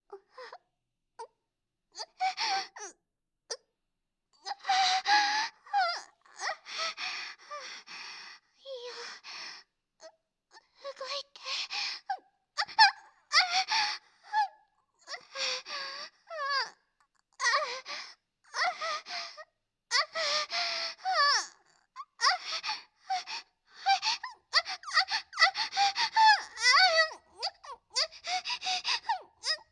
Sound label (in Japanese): 挿入 喘ぎ; SEなし